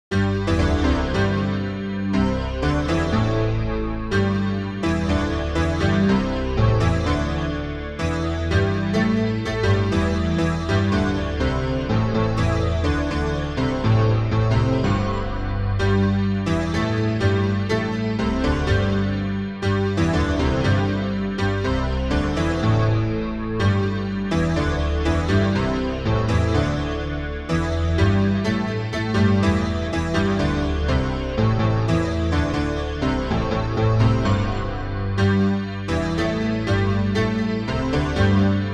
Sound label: battle; xuexi